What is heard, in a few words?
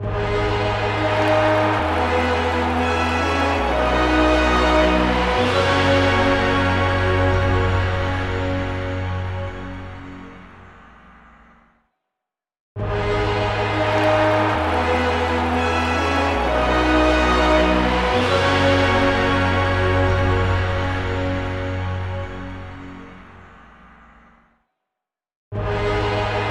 Stinger End of level; LEGO Marvel Super Heroes Universe in Peril; PlayStation Vita